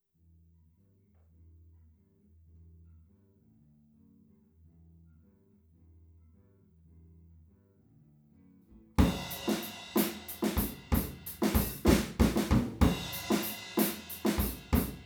PZM omni drums

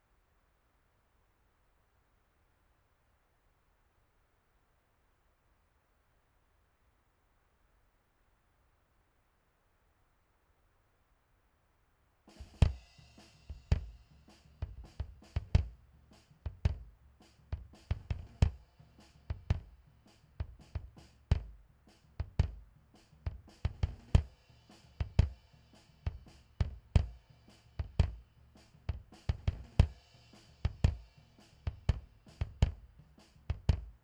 Drum

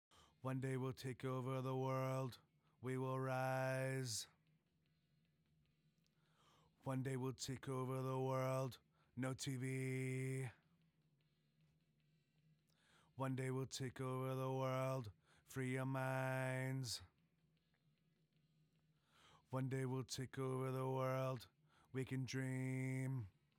Vox Verse Low

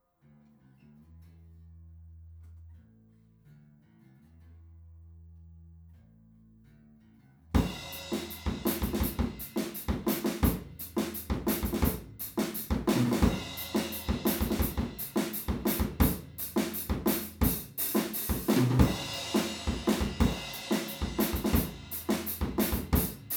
PZM Omni, Drums

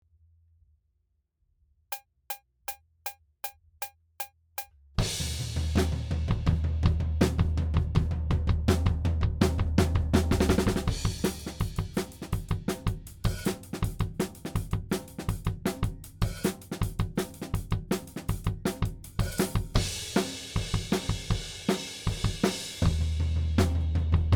Drums ONLY; Pre Pro

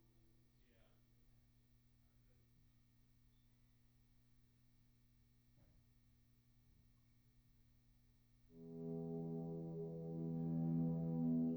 guitar room; RodeNT1A

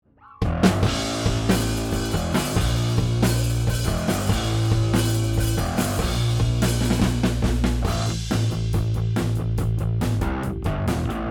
Drums and Bass Post Bass Comp

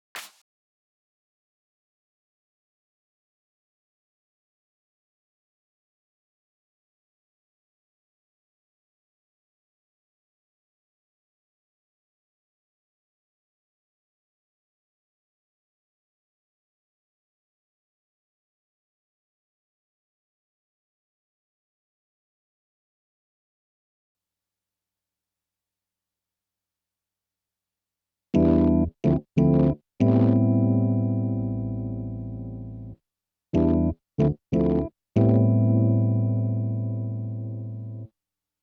Keys

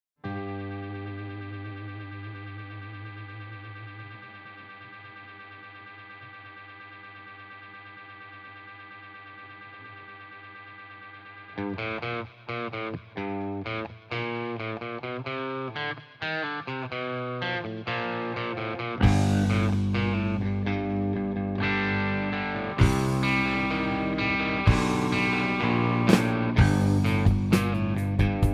Instrumental Mix

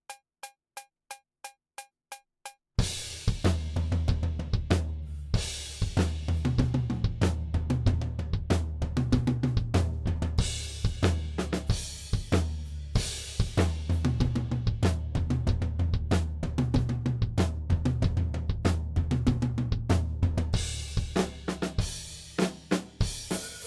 Drums ONLY; Pre Pro

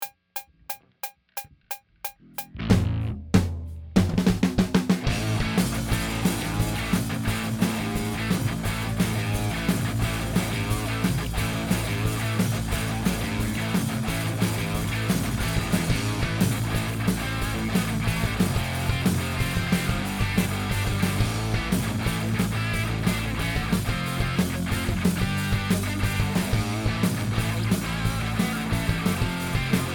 178 bpm; Pre Pro

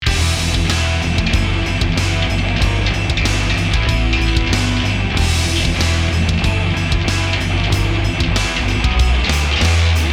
Riffy

